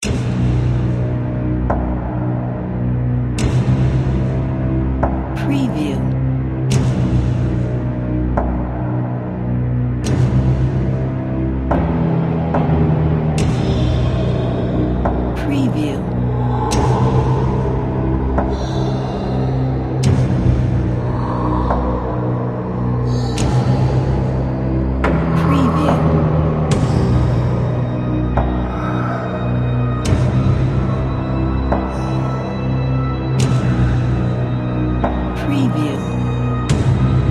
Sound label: scary horror fear trailer music